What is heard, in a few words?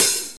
sparkle hat sound sample g sharp key, Royality free hat sound tuned to the G# note